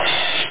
hhat open; opst-hhatopen; 8,363 sample rate; Amiga 8-bit Sampled Voice

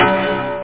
low bell; OctaMED SoundStudio V1; drumkit

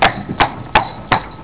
Horse Walking

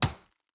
quarry rock

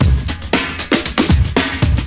breakbeat; World of Sound; instruments; beats

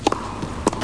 tennis; winpolis

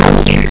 mine; kyraqst

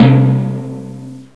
TIMP; Descent II

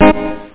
roll; Aminet 21; GTI - Schatztruhe; Amiga 8-bit Sampled Voice; 8,363 sample rate

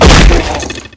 BODY WALL, HARPOON; WEAPON

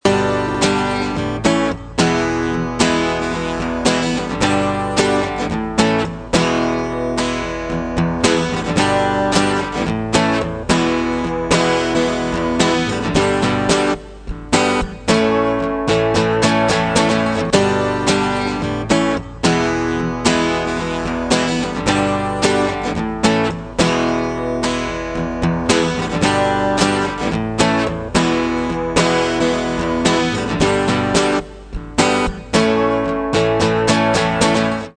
GUIT; MOBICLIC 83; CPLUSCLAIR

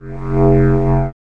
pulse

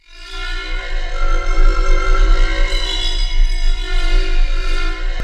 bowed mandolin; DirectX SDK; DMusProd

